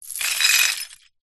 broken beer bottle wet sweep brush

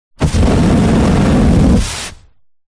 FLAMETHROWER Medium Heavy Single; WEAPONS

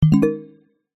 multimedia game sound tone wooden mallet generic

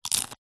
eat bite crunch tortilla chip; human; zapsplat

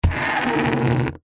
foley plastic tub lid scrape short concrete; zapsplat

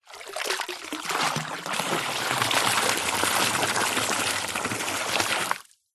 nature water pour medium amount deep

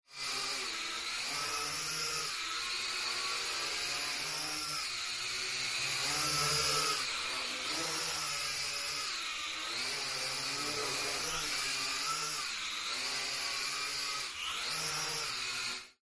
Drone Falcon; fox audio

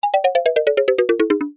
cartoon descend med fast dual tone mallets; zapsplat

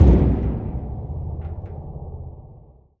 Impact Boom Metallic; bottlerocket

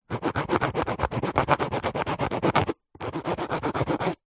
foley cardboard cutting with serrated knife

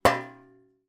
impact metal dustpan

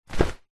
sport wetsuit drop ground; zapsplat

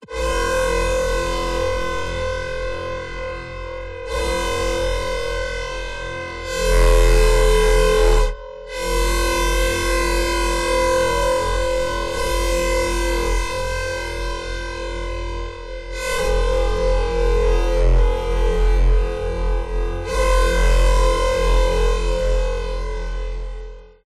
stretch; Gregor Quendel

